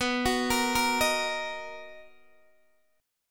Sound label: B Major 7th B down Guitar DADGAD